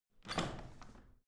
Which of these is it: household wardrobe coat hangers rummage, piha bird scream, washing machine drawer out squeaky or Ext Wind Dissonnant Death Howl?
washing machine drawer out squeaky